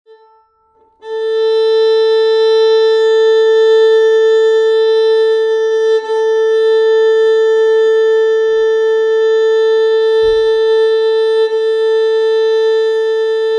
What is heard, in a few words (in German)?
geige a saite stimmen